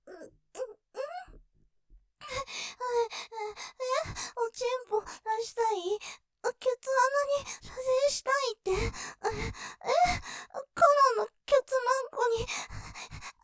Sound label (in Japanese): 喘ぎ; 射精寸前！ケツマンコに射精するの！?; ループで楽しむ！シェフの気まぐれ抜き抜きコース